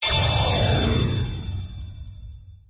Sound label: quest get